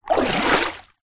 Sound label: nature water movement